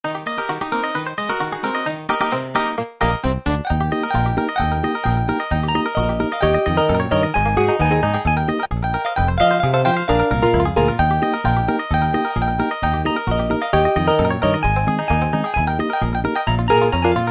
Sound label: midi holiday country